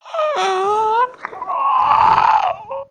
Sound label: female zombie sighted; nmrih; zerosiki; slender